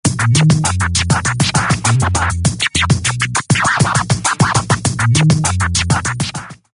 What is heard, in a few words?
razor style scratch